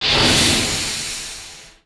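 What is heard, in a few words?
Damage Fire; Knight Online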